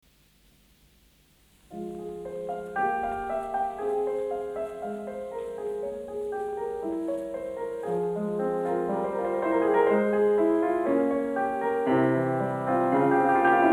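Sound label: Prelude in F minor, piano; Bach; WTC, Book 1; Prelude No. 12; BWV 857; FISCHER, Edwin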